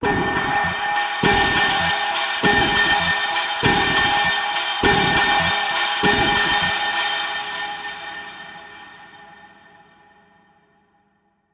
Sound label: Pulse Bells of Silver